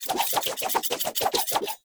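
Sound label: Dagger Projectile Whoosh; Weapons; Enemy; Daggers; Sinecure Dagger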